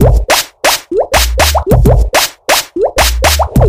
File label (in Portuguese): beat bolha mix